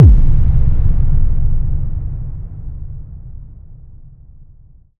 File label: modern cinematic impact boom; BluezoneCorp